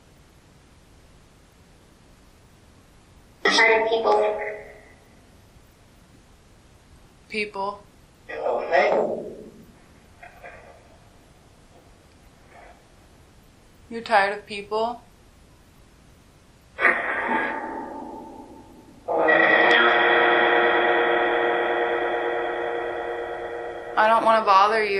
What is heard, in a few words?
Phasma Box; Canopy Room